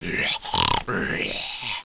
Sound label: growl; animal